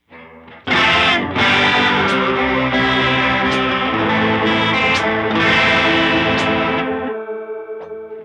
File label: Moogerfooger MF-108S CHORUS LFO sawtooth